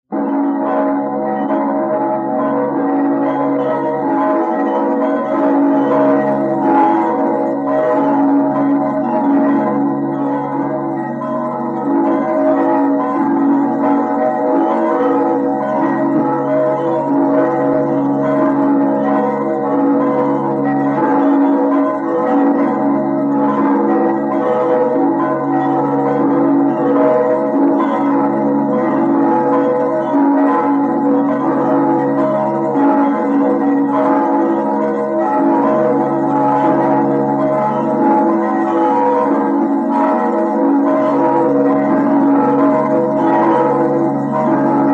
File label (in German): alle Laubaner Glocken